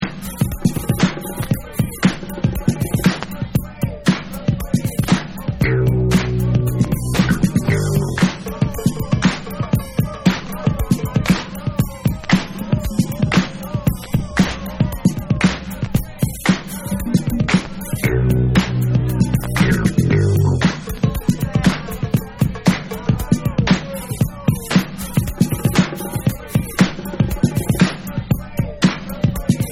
Bonus Beats

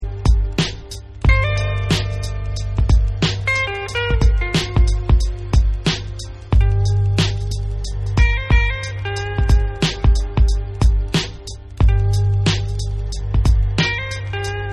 Instrumental

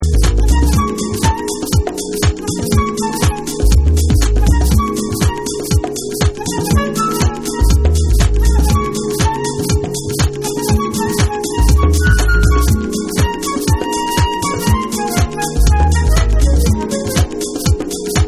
Afro Lounge Dub

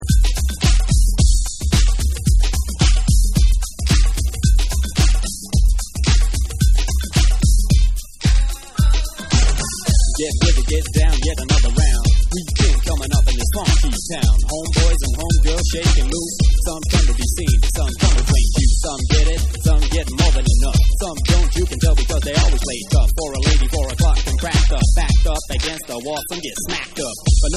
Basement Beats And Rap

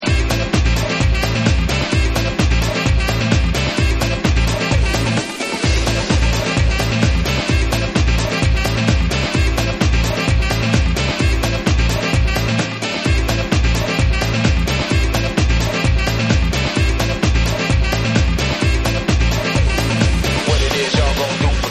Saxxy Vocal Remix

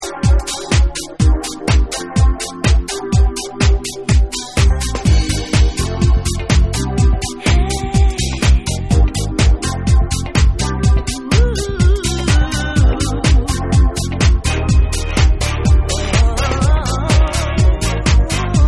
Main Vocal Mix

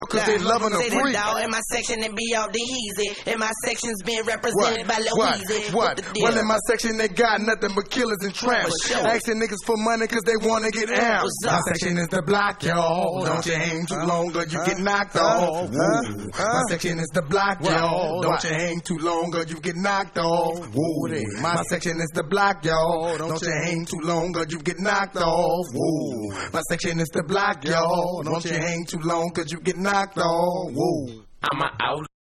Acappella

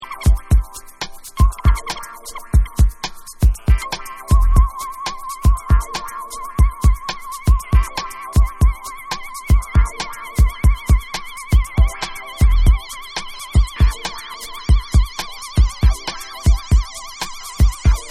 PARTIDO NOVO Key Beats

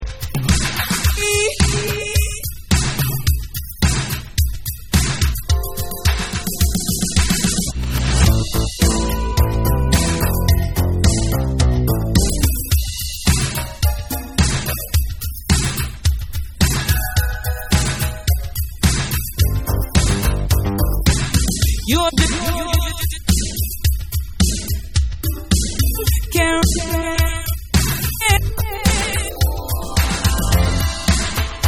Dub Delayed Remix

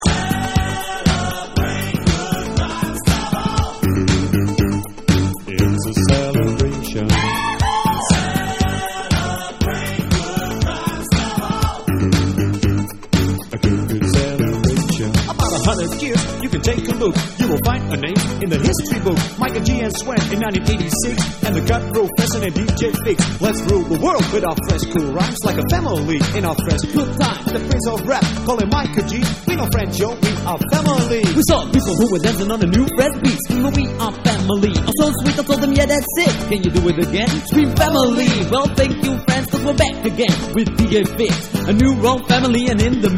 RAP

